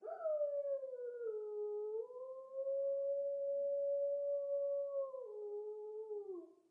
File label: wolf howl